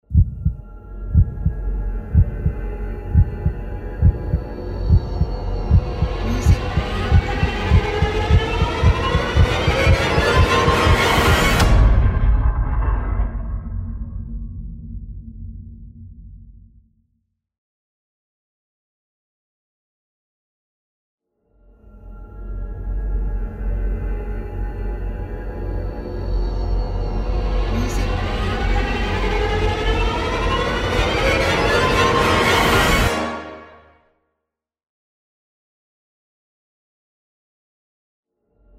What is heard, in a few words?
Heartbeat Suspense Intro Fx